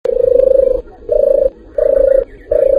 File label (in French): Tourterelle Des Bois; Columbides; Pigeons, Tourterelles.; La Tourterelle des bois